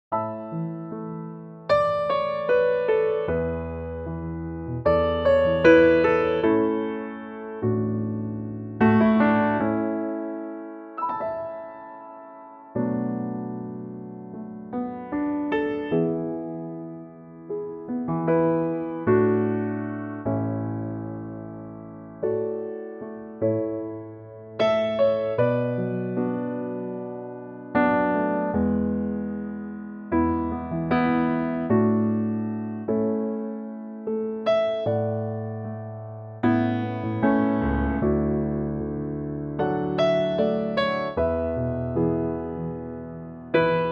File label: A to Bb piano